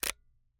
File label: Camera, Kinusa K77, 50mm lens, plastic, serrated, lens adjust; Eiravaein Works; Vaeyan IV